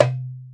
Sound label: arabic perc, Arabic Percussion Kit